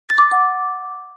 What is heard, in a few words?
Cute Sms